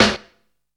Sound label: tuff style, snares; Zero-G - Funky Elements